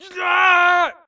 grunt electro; Titanfall 2

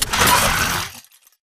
Mvmt Servo Walk; AI Zombie Stalker; Titanfall 2

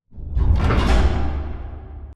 Dome Power On Giant Switch; Titanfall 2; Boomtown

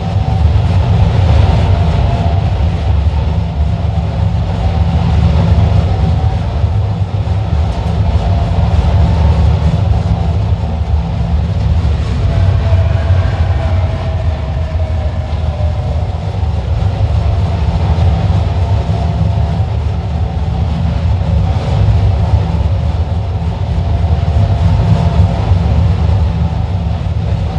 mechanical rotator servo close; Titanfall 2

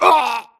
grunt death neck; Titanfall 2

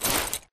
Grunt Mvmt FOL Soft Short; Titanfall 2

